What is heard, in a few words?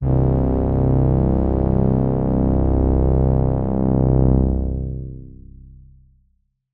STR C1, Yamaha TX81Z synth pad; Pads